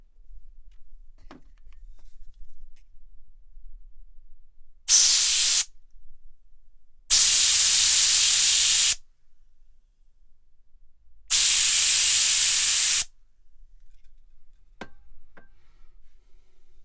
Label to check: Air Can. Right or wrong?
Right